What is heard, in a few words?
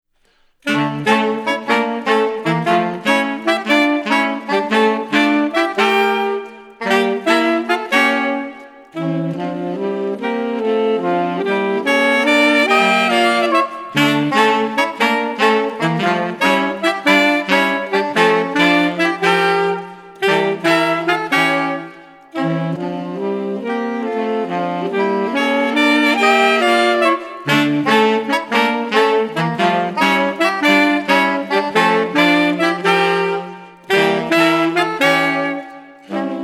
saxophone quartet